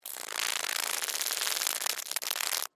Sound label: Alien Insect Move, Scifi, Alien, Insect, Movement, Shake, Rattle, Buzz, Science, Fiction